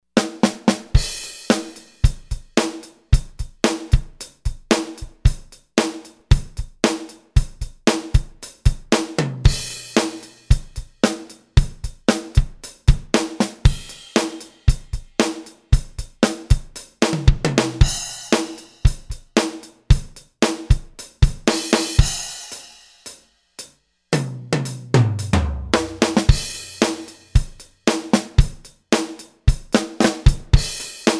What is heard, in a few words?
Dry Rock KIT, Roland SRX-01 Dynamic Drum Kits